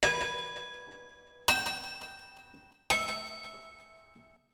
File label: magic spell; xyzzy